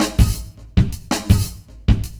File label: LOOP, 70's Breakbeats; USB Soundscan vol.46; AKAI